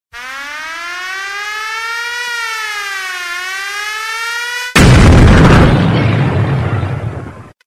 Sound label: Siren Bomb